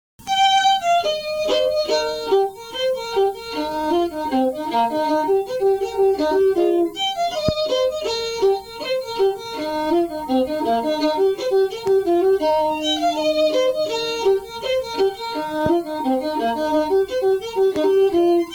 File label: kittys wedding fiddle; tune tutor